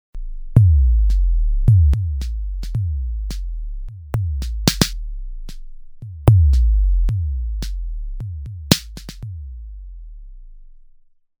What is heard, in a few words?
Mogees